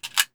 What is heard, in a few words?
Mag Out; svencoop; 1911meu